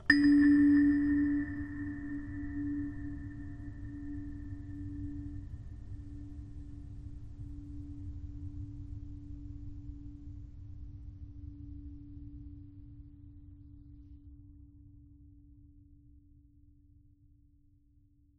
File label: ambient abstract chimes low; Half Life Model Pack